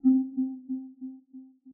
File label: menu; gui; blocks